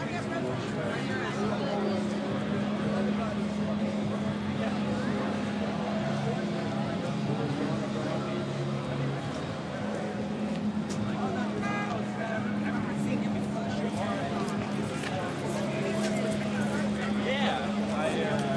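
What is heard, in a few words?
metal music coming from 3 different directions; sxsw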